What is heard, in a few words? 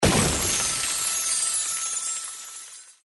bigwin star